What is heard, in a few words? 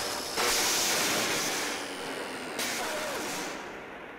neon sign off; limbo